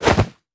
melee attack fist; viper

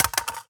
phys mag drop ak metal concrete, magazine drops

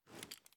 charge reload fast grip; gmod

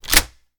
reload close, sh; gmod; wfoly plr sh charlie725

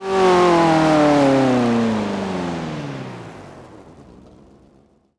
throttle off, vehicles; ferrari 458 risi

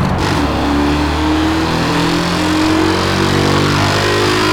third, vehicles

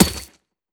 frag physics bounce mud hard; gmod; viper

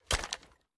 reload fast stock up; xmag; gmod; pi mike

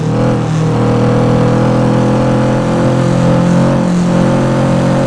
4th gear, vehicles; blista

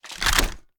reload start rotate; gmod

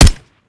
mike4 sup, weapons; gmod; viper

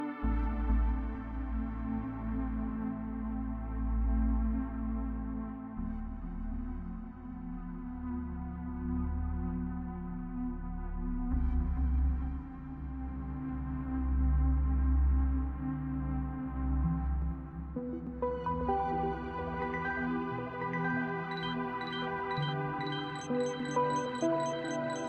Ambient; MiSide Soundtrack; Ghost Mita

